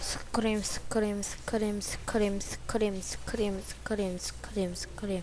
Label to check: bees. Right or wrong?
Wrong